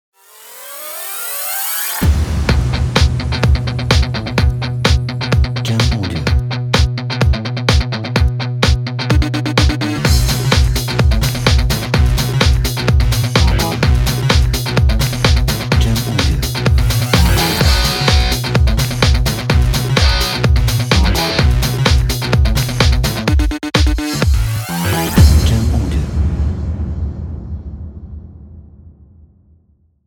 Energetic Heavy Action

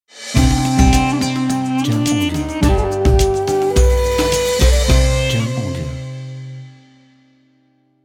Ramadan Ident

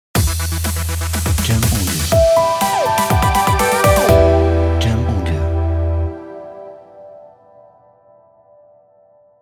Corporate Ident

